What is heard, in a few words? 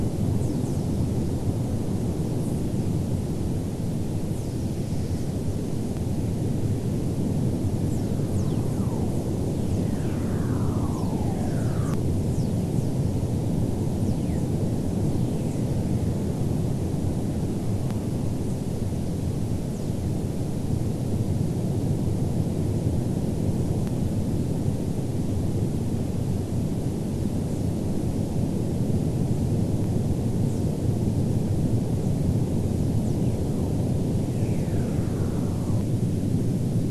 WFR audio emfisis